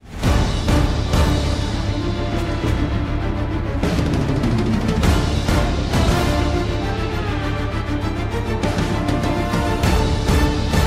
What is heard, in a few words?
ringtone; Formule 1